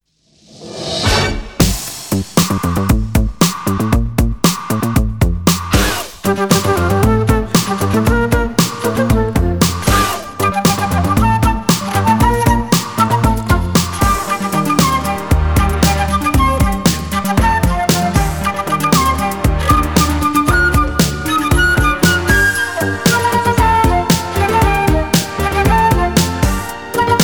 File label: Flute